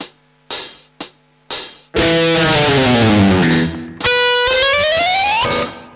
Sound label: leg